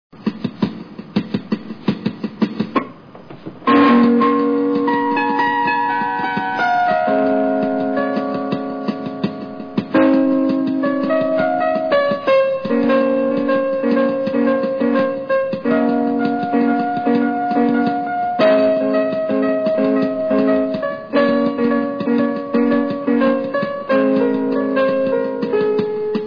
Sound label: piano batida